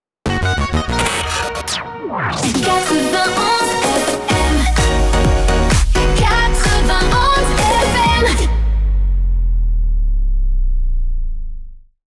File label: Chanté F# 128 BPM RapidFire (No VO); 91FM